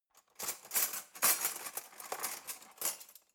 cutlery knife drawer rattle long

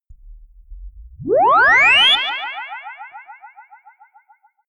quick liftoff ufo

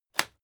wood break short

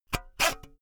saw use short unsteady

